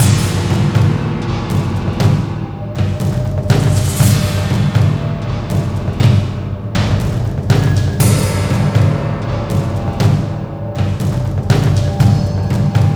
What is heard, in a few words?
Combat Music; Cursed Mountain; Wii